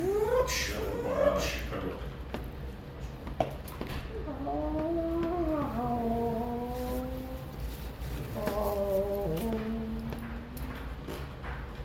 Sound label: vroom